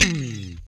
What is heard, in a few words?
Guitar Slide FX, Roland BK-5